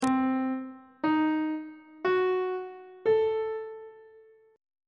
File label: d double flat diminished 7th chord intervals; treble clef